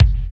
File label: KICK, Tekno Industrial; East West